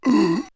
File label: Fear Stranglers, vox; vox mix; X-Static Goldmine